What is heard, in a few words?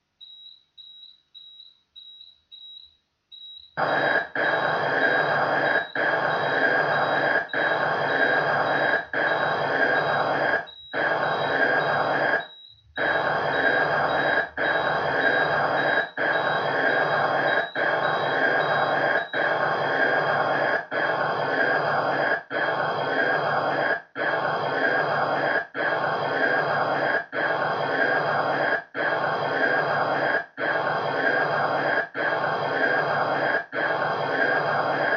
rocket